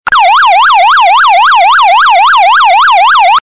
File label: Yelp; remote compact 3920